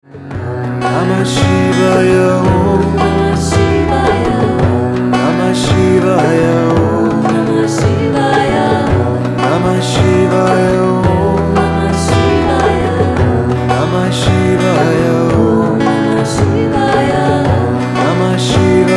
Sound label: Namah Shivaya